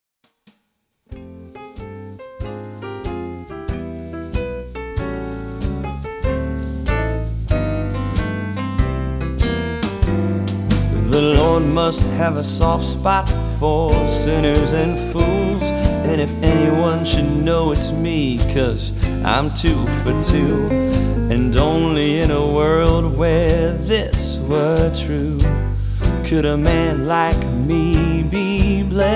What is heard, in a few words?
jazzy